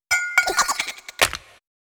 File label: Stone Coin Jingle; bayonetta 2